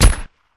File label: Gun Shot Silencer, silenced singles